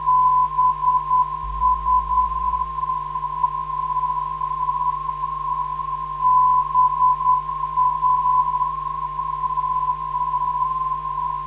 427kHz, ndb

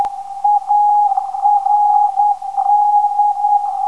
515kHz, ndb

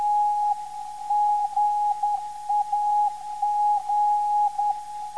370kHz, NDB; GAC

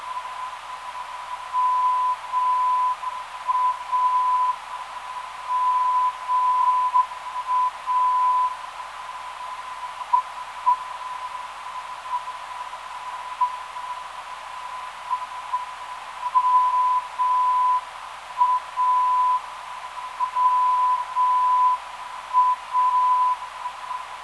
MA 880kHz

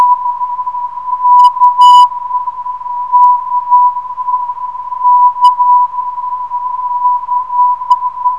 7039.3kHz, NDB

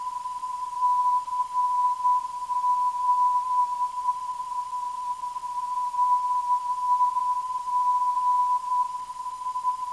352kHz, ndb

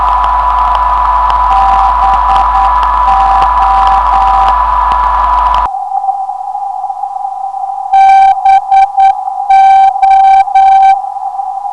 383kHz 400 60Hz, ndb

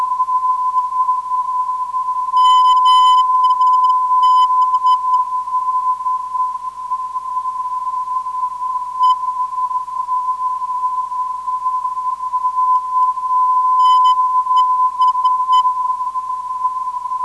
395kHz, ndb

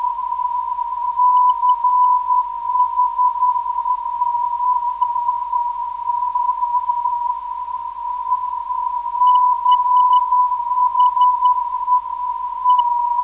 397kHz, ndb; CHE

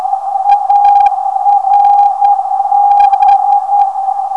353Khz, ndb

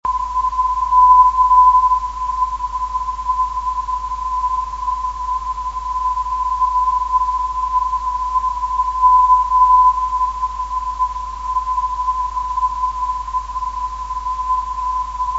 266khz

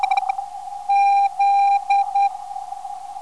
Z 508kHz, ndb

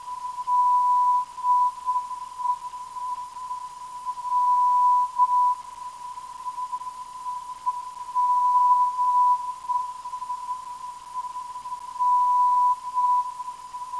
841.25kHz, ndb

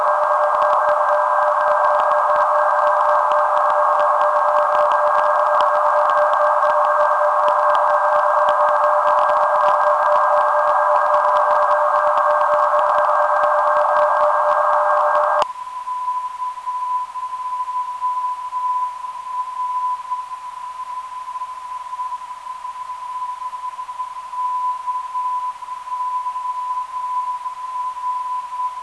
322kHz 400 24 8Hz filter, NDB; KOR

